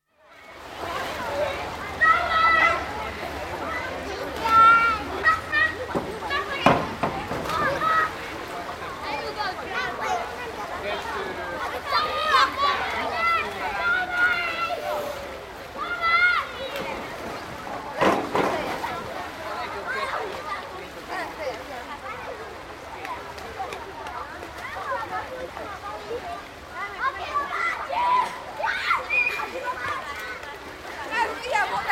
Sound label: Open-air swimming pool, St Margarets Island (Budapest), noisy, with children splashing and playing (distinct speech), clunks of diving board, generator no; generator noise; BBC